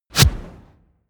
push

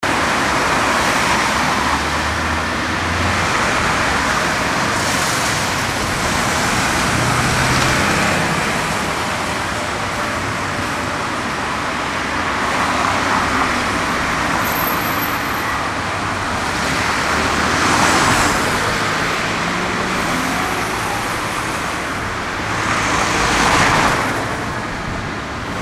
city street crossing

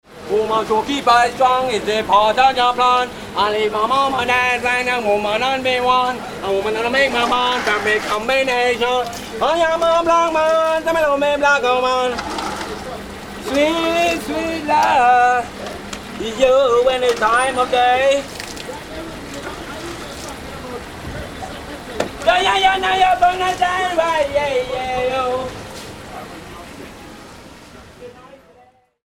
song carwash caribbean

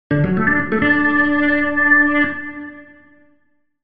american goal

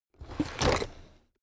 drawer cabinet stuff open heavy

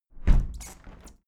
window plastic squeak close regular sequence

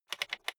rotary control short turn quick soft

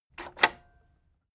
cassette eject click fast

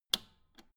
old button simple press

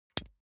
lighter electric switch

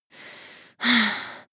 breath female intense

